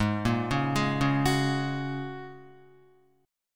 Ab 7th Suspended 2nd Suspended 4th down Guitar; Ab7sus2sus4 arpeggiated

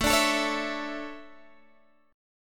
D Major 7th Suspended 2nd

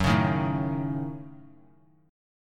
F sharp Minor 6th F sharp; strummed; JGuitar

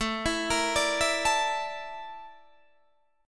A sharp Major 7th Flat 5th, chord

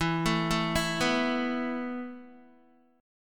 E Suspended 4th E, down, Guitar; Esus4 arpeggiated

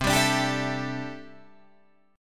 C 6th Add 9th C down Guitar Drop D

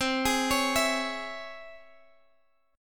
C sharp Minor Major 7th, chord

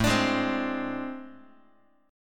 A Minor Major 7th Double Flat 5th, down, Guitar; strummed; JGuitar

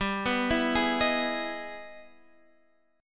E Major 7th G, chord; arpeggiated; Emaj7/G; JGuitar

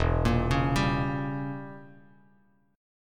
E Diminished 7th E, Bass Standard; Edim7 arpeggiated; JGuitar

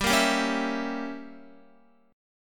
G Diminished 7th chord, down, Guitar; strummed; JGuitar

